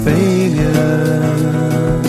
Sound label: failure